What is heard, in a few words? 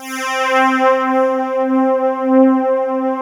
PULSATN, PULSE; PULSENSE